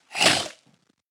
adlet angry, utterance

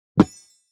staff in, weapon

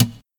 hit; voxygen

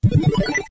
bleep; abilities

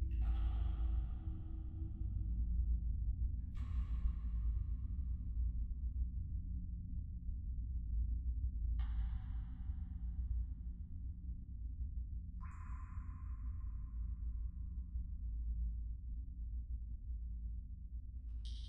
cave, ambience

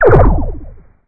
laser beam

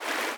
fast water, river sounds

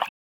running water, river sounds

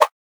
arrow shot

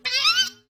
bird angry, utterance

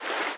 fast water, river sounds

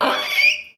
pig angry, utterance

